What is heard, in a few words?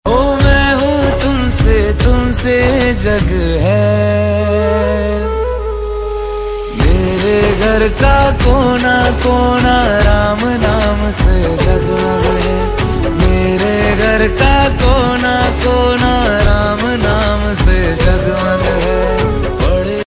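devotion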